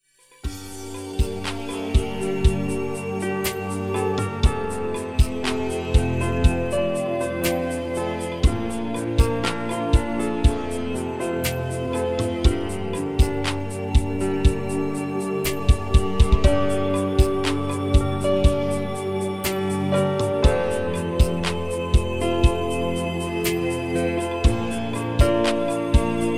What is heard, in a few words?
spa music 432 hz